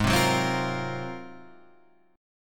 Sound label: G sharp 13th, Guitar, Standard; strummed; JGuitar